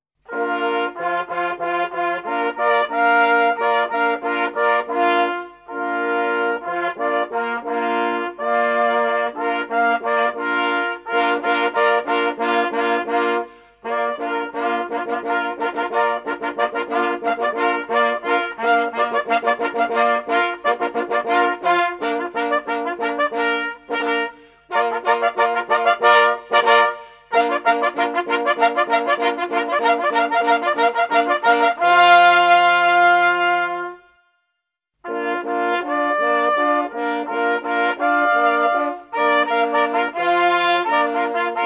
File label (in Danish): horn accellerationer flerstemmig